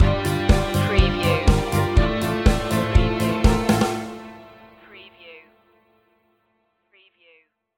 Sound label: stinger